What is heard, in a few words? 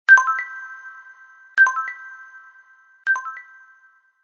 HTC New Tone